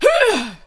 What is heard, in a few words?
Death Vocals